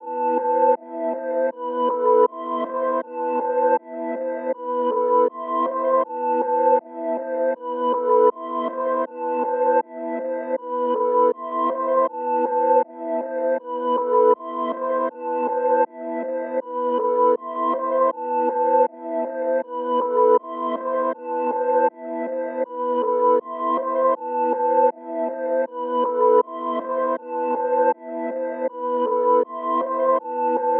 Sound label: 159 BPM E Min Reverse Pad; Melody; Loop Stems; Cymatics; 2022 Melody Collection - Reverse